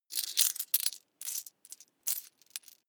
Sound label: coins search push